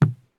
run wood; Escape from Tarkov

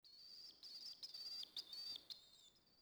park occasional

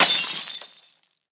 Amb Destruction; Red Orchestra 41-45

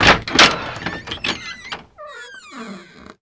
door wood squeaky slow open; Doors; ModernWarfare

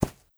horse footstep grass hard; Rust

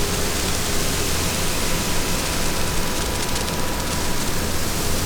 emitter vaporize

